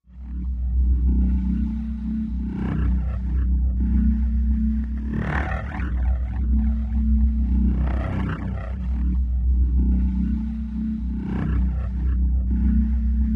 Redux Machine Low Echo, pulsing

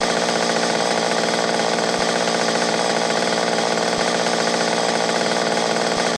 Chainsaw Med Idl Loo, chainsaws

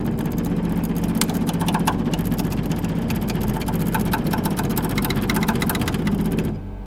Computer Printer; printers

